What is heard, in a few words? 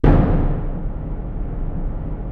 Hit Loop; Digital Sound Factory Virtuoso